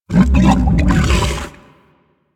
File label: Helminth Idle Stomach Rumble; Warframe; Hub; Liset Alchemy Lab